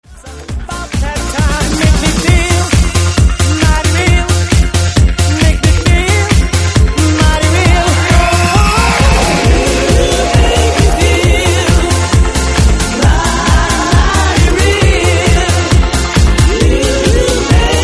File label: Disco Heat